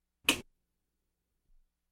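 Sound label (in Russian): щелчок выключателя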